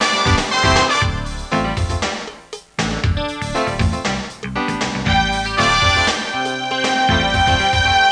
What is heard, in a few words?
Music Loop